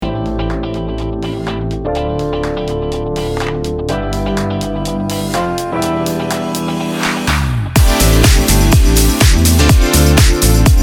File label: electric sunset edm construction kits; big fish audio